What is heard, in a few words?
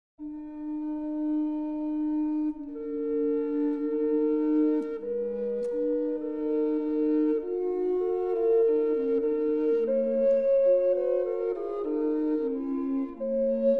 Tenor and Bassett, recorders; Berlin